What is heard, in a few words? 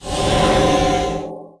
Magic